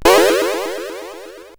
Remix, sid CSG8580R5; hvsc

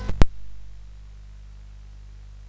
sid CSG8580R5; Gryzor; hvsc

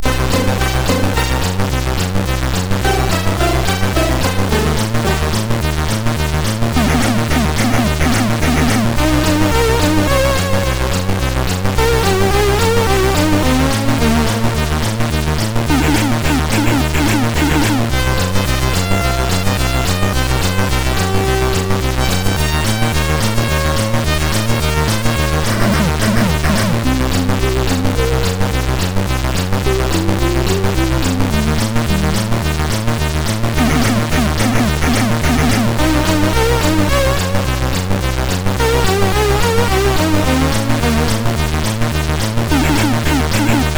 sid MOS6581R4; hvsc

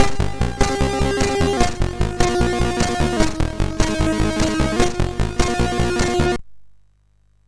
sid MOS6581R3; hvsc

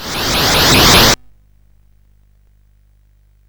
sid CSG8580R5; hvsc

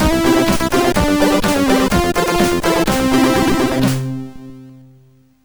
sid MOS6581R4; hvsc

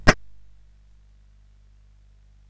sid CSG8580R5; hvsc

